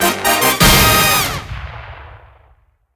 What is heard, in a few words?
large win; disco danny